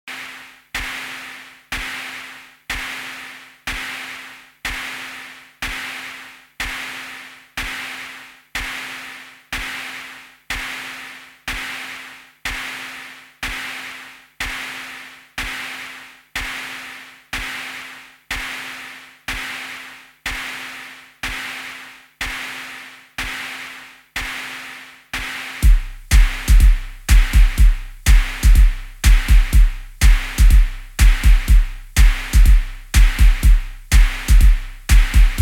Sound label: Noise Perc Kick